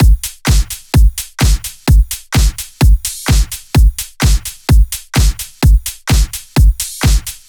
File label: Loops 128BPM, Drumloops